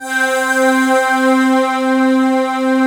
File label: FINAL PAD; ENDINGPAD